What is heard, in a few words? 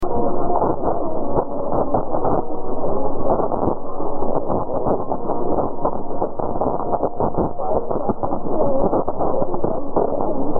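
Algeria, radio; Algeria Chaine 1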